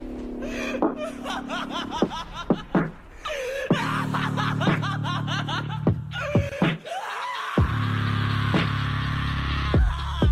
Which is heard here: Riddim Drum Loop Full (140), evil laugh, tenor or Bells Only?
evil laugh